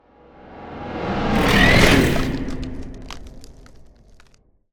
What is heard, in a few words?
Ice Spell Whoosh, Cold Whooshes; Arctic Stutter; Arctic Aura